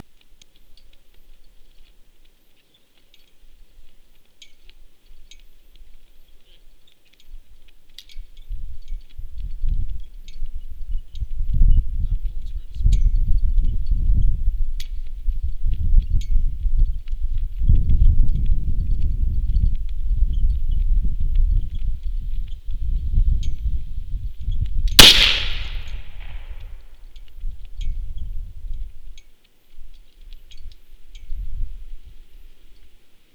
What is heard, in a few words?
Short Rifle, Flintlock Rifle; forlorn